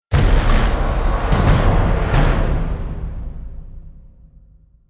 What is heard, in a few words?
Stinger Action Medium